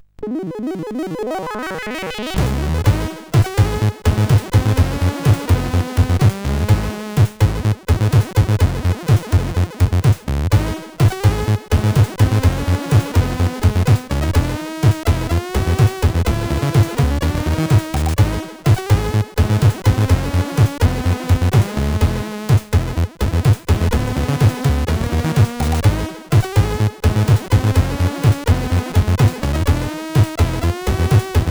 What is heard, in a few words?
sid, CSG8580; 8580 Funky Ideas; hvsc